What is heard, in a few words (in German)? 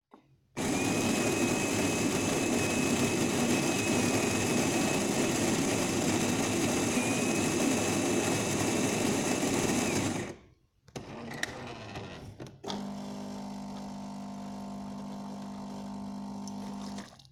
die Geräusche der Kaffeemaschine; magic-coffee